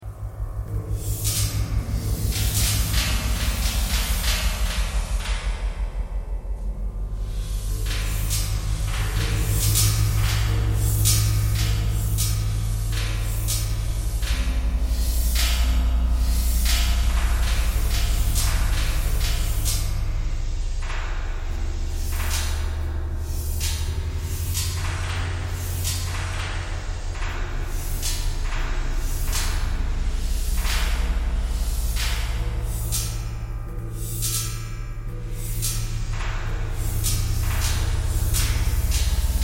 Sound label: knocking thread noise all